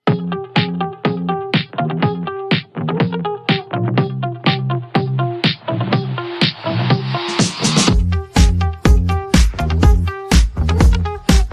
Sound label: Instrumental